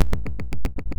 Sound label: beat